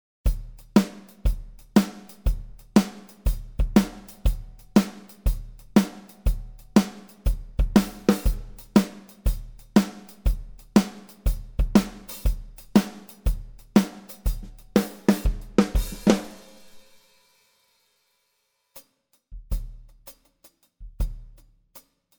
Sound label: Pearl Kit; Superior Drummer 3